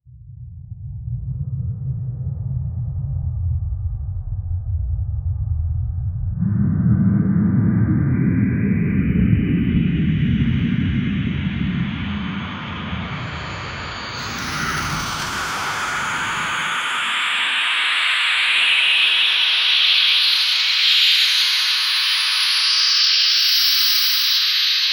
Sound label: a sci fi hard landing and, crash